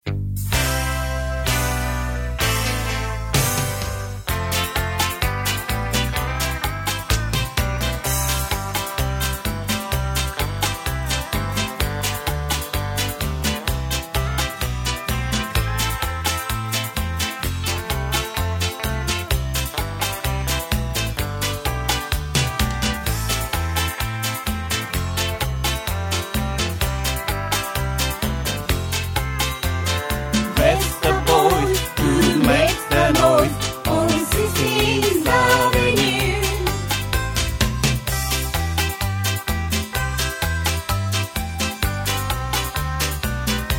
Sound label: harmony